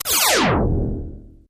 Space Laser Shot

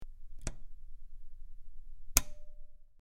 Button Press In Out, switch clicks

